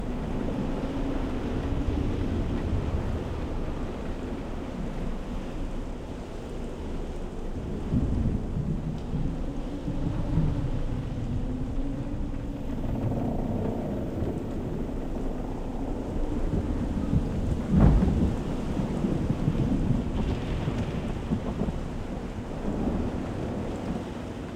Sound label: Amb Mansion Stormscape